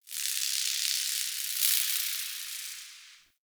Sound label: Insect Wing Flutter; Ambience; Warframe; Nokko Colony